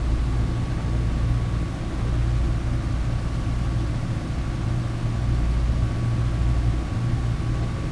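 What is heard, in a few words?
Ship idle loop; vehicles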